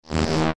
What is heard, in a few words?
future buzzing elements layer